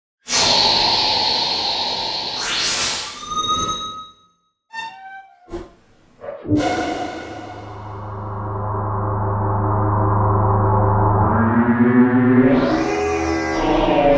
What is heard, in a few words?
Synplant 2 Flute of Life; Soniccharge